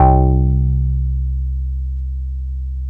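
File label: OB8 piano C1, OBERHEIM OB8; Big Fish Audio - First Call Vintage Keyboards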